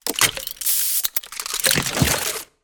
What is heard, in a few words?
future weapons bio gun reload; fvtt sonniss gdc audio